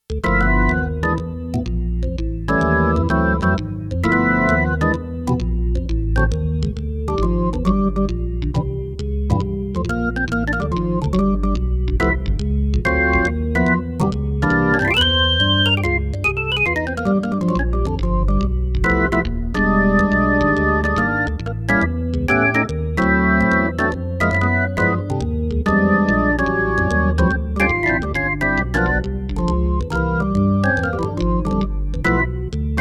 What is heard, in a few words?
JAZZ ORGAN, Roland JX-1; Factory presets